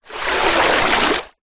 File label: nature water whoosh pole swipe fast surface splash